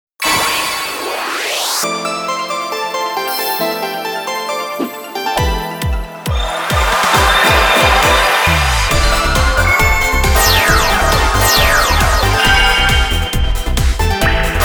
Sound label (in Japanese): 変身シーン効果音